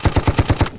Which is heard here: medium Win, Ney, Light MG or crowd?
Light MG